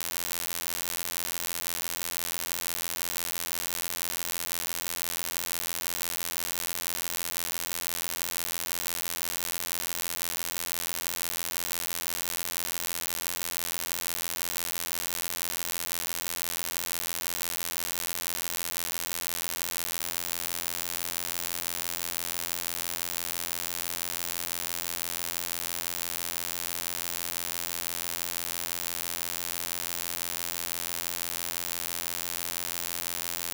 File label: stereo sinc; dac fractional delay